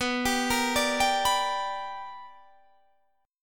B Minor Major 7th Sharp 5th, chord

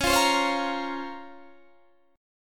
Db Major 13th, Guitar Standard; strummed; JGuitar

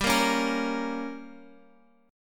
G Diminished, Guitar, Open F; strummed; JGuitar

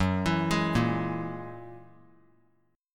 Gb 9th Gb down 3C,3F,3A,3D; arpeggiated; JGuitar

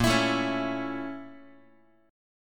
Bb Minor Major 7th Flat 5th, Guitar; x,6,7,7,5,x; strummed; 4A,4E,5A,5D,5G#,6C#; chord shape

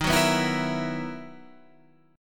B Major 11th D sharp, guitar

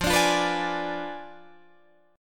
F Diminished 7th F down Guitar Drop D

